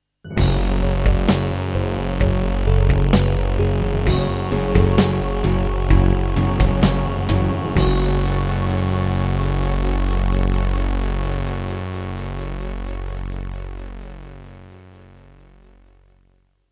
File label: NEC XR385 (DB60XG Clone) GS MODE; Doom (Sigil); Introduction